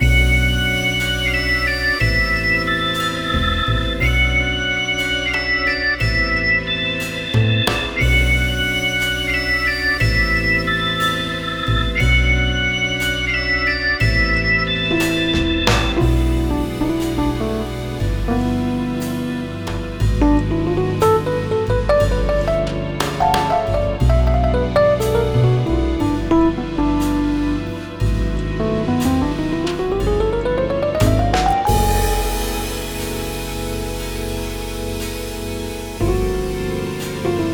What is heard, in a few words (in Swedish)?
jazz fundering